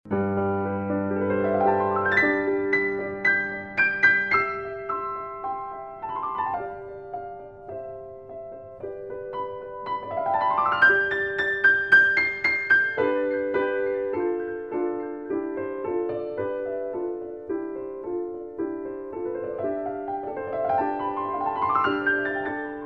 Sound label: Piano